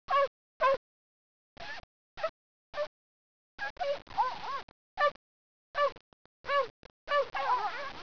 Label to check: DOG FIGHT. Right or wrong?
Right